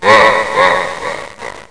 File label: death; robotron